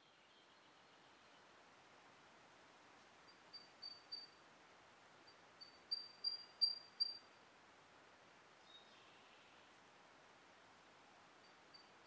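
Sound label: koruri, Siberian blue robin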